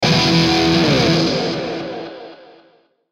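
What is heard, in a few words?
Musical Element, Drum Snare March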